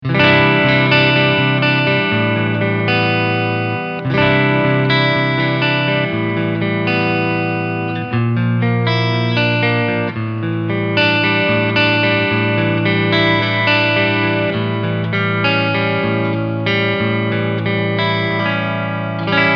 Stegpickup clean

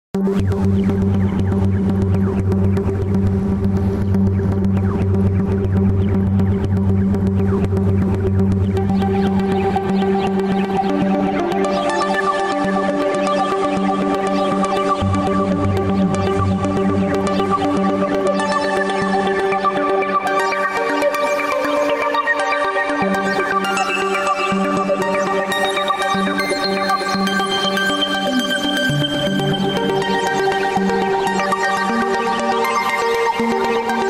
WALDORF IRIDIUM KEYBOARD Werksound

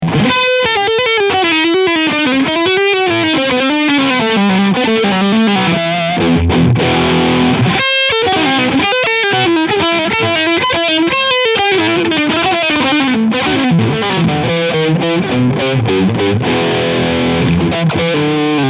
Leadsound Steg PU

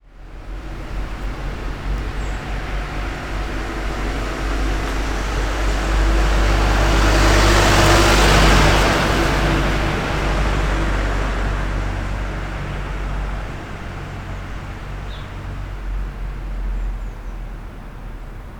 Vorbeifahrendes Auto; Mackie EM Carbon

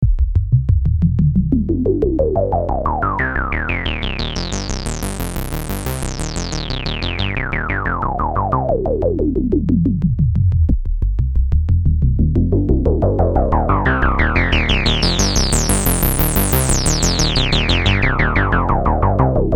filter mit clean drv drvdc

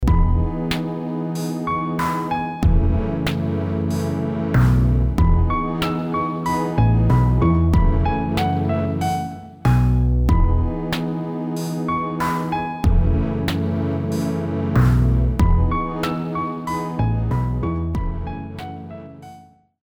4taktige Loop; Artiphon ORBA 2

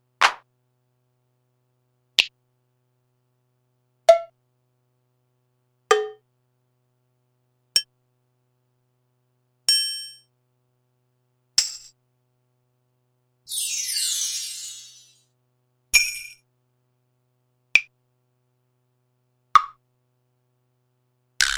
Samples Dry alle

